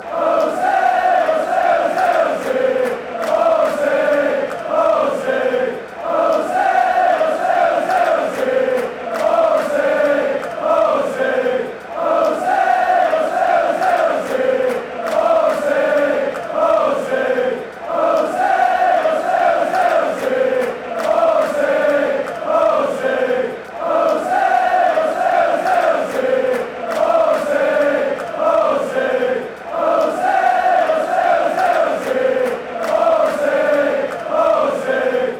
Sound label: chant; jose enrique